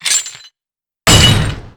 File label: shackle break; blackquill